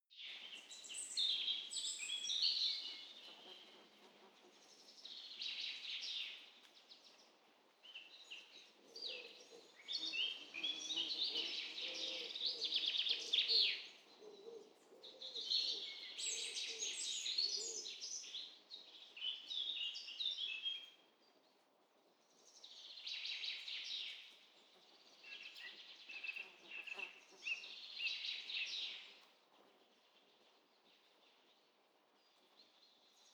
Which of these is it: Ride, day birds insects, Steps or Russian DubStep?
day birds insects